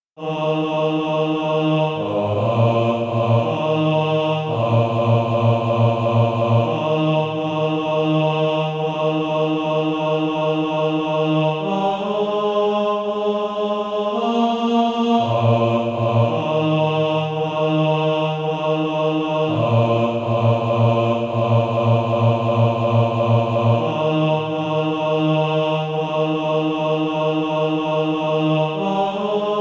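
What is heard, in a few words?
bass; SATB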